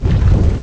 lava; Undercroft

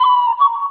flute; Undercroft

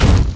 hit fire; Undercroft

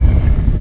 move stone; Undercroft

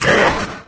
wraith, enemy; Undercroft

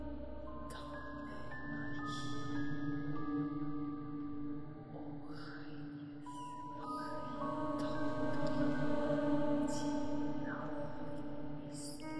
forest, music; Undercroft

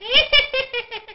laugh; Undercroft; npc

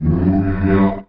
choir; Undercroft

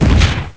hit fireball; Undercroft

